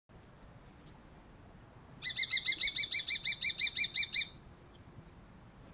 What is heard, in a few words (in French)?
faucon crecerelle